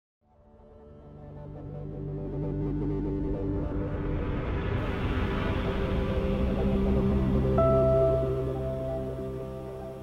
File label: Piano